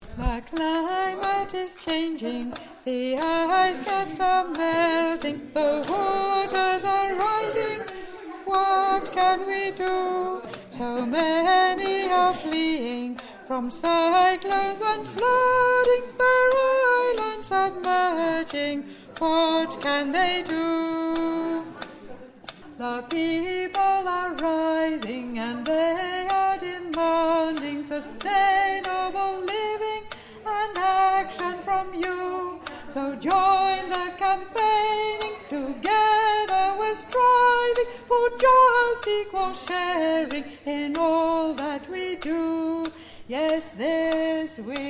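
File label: Soprano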